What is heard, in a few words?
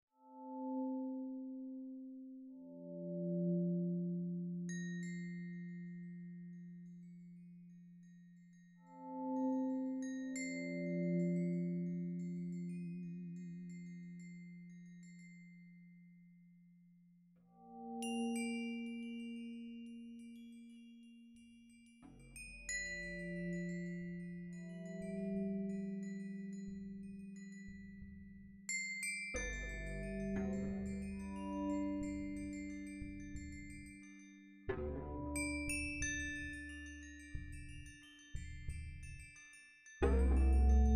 Bells, Oompah music